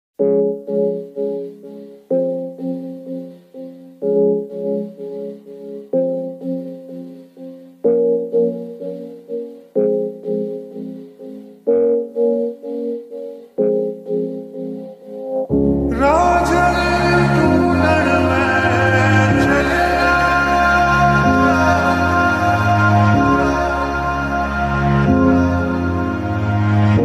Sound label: sad ringtone; badlapur